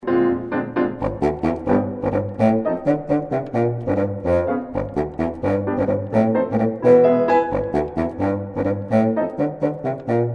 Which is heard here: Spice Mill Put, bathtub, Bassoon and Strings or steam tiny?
Bassoon and Strings